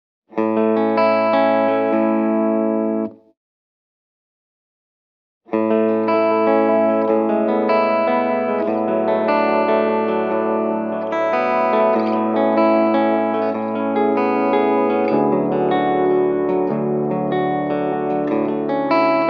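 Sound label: Vintage Oil Can Echo, Lush, layered and modulated echoes are created from a higher delay time on the Long Heads setting. At the end an expression pedal increases both Mix and Regen.; Post-Rock Tumbler; Dry and Wet mixed in Pro Tools; Olivera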